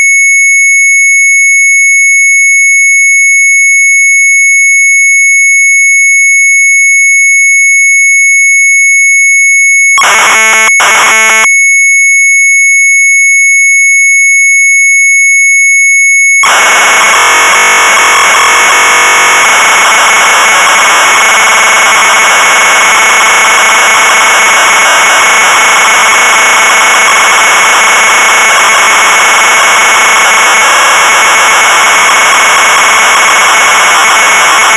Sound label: MZ800 Tape, sharp; BOWLEN PROG.; retromelsarchive